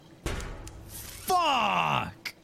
clank

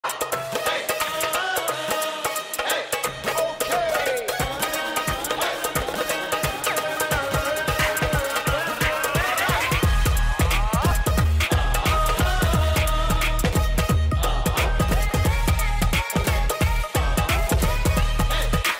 bhangra boogie emote; fortnite

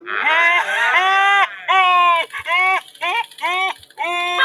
crying

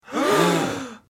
crowd gasp

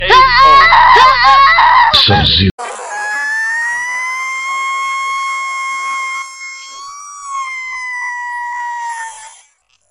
female scream

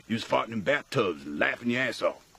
fartin in bathtubs

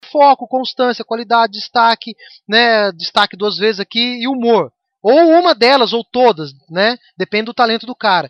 hahaha

